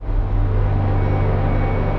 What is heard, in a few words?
Soft Emotive Pad; Ethereal Pads; Ableton; Mood Reel